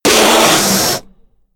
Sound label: snake strike